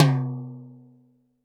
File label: TD-20 Tom A, Roland TD-20 Toms